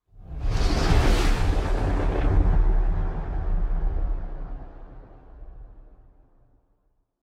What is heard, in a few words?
ship colossus move; Stellaris; machineage